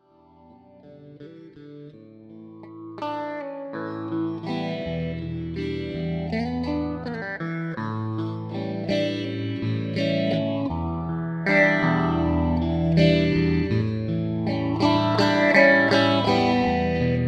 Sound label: Phaser